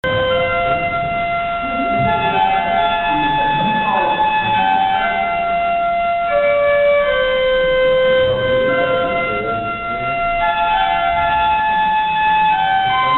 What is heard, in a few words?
Organ Amazing Grace; germanytour